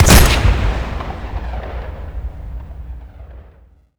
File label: hiker 1shot 3d